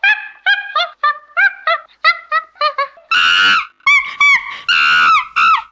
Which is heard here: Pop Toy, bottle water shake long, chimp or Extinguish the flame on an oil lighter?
chimp